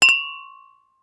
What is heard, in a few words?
Wine glass Cheers